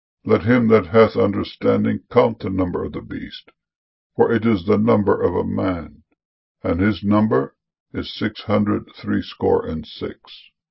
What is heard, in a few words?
harping